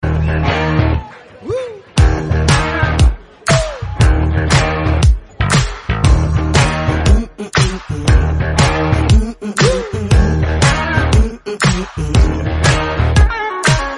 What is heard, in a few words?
Bgm Tamil Ringtone